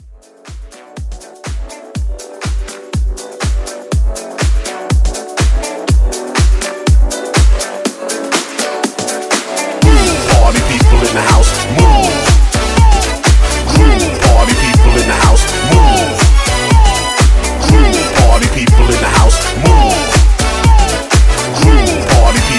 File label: Club House Mashup